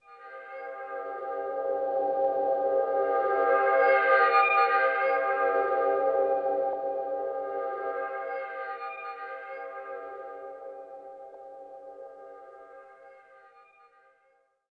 waterphone c6

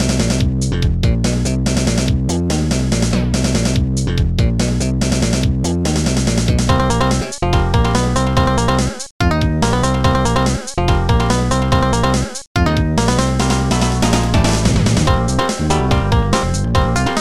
sweetie land funk

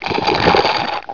Dam slurp; SimTown 1.1

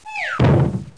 Drop; Bible Fun Pack; Education Publishing Concepts

